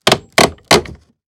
Industry Tool Nail Pry Nail From Wood

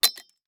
Weapon Sword Pull From Wood; AdobeCC

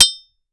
Weapon Machete And Axe Hits; AdobeCC

